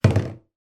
household bleach cleaner bottle place down on hard surface in bathroom